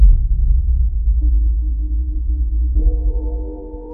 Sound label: robot beep; sound effects